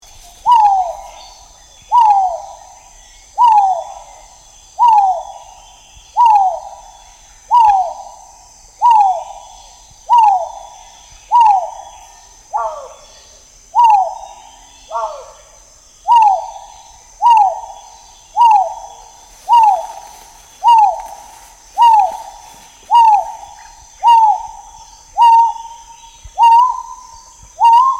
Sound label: Argusianus argus grayi